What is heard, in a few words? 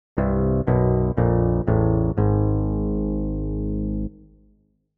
game over